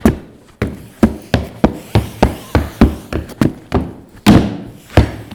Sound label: Wood